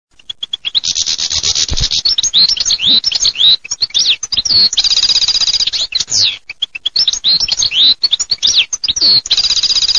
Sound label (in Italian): CARDEL